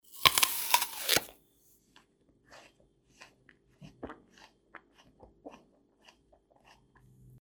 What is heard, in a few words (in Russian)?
jabloko s glotaniem; Звуки откусывания